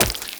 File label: Wall Jump Flesh; Warframe; Tenno; Wall Run